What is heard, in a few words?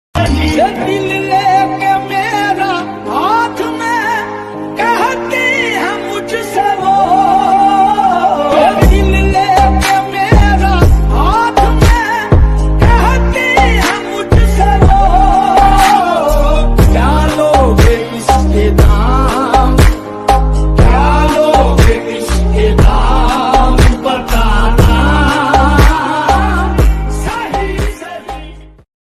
OST pakistani ringtone